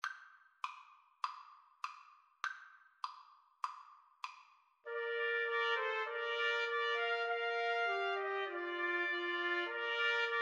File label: 3TPT, Trumpet Trio